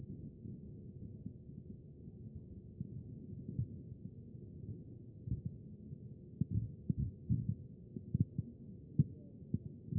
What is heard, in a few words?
CHEST pos4 B MODE